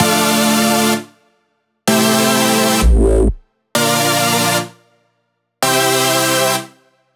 128BPM Melody Root D; Uprising; Vengeance Samples; Uprising Kit

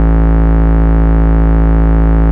Bass Root A

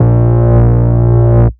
Root A#, Ultimate Bass; Vengeance Samples

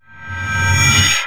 Sweeps Short

